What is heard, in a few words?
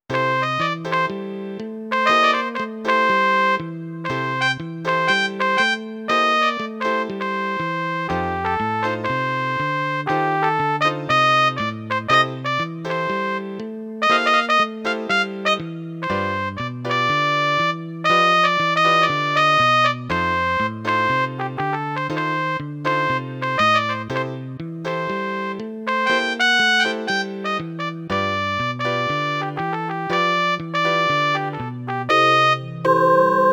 MIDI blues